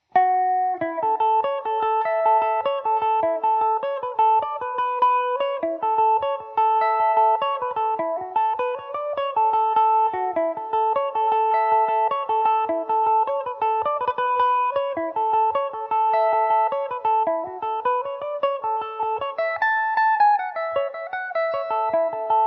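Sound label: A major, Irish mandolin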